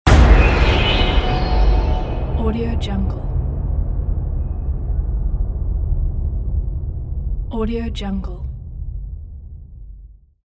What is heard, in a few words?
scary piano horror